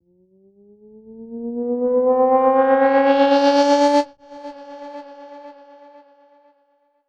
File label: Filter Proc, alarm siren samples, 120bpm